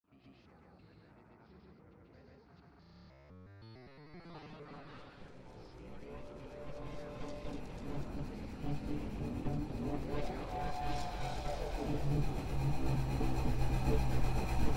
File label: noise